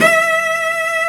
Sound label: CELLO E5; AKAI S-Series CD-ROM Sound Library VOL-2